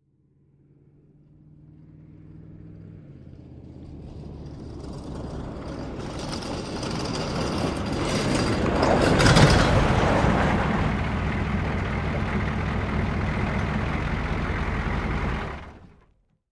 Humvee Squeaky Idle

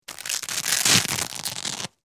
Velcro Or Cloth Rips C

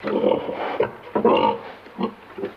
Pig Grunts Low Key

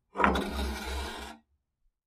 metal gate latch; latches & bolts

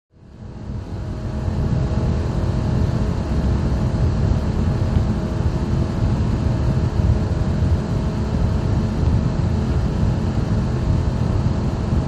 Power Boat Int Racin, off shore power boat

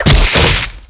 Bone Breaks Blood

